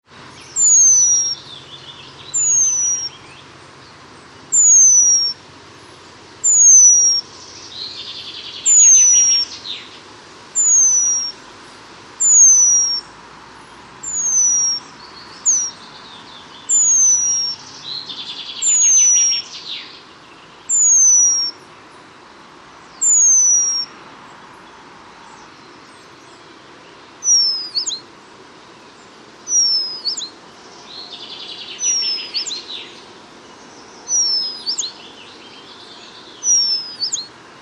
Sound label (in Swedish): Pungmes